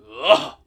Grunt; Nailmaster

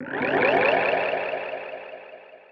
Get spell; Touhou Jitsuzaisou Dream Logical World

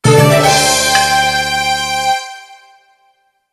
New Stage Unlocked!; Hammerin' Hero; PSP

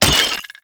Crate Destroy; unsighted

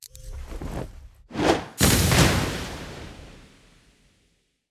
Smoke Bomb

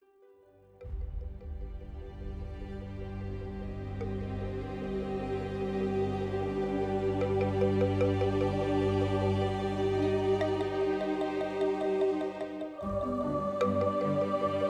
town music

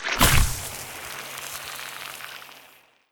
Plant Throw; Legend of Keepers (gamerip) (2019)

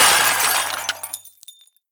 Building Particle Falls On Floor; Rampage

